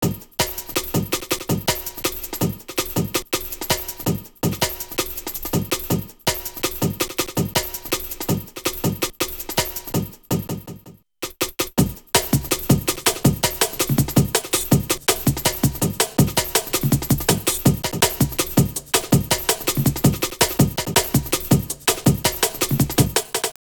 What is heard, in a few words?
breaks, Jungle; Zero-G Jungle Frenzy 1